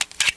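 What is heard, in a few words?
uzi reload clip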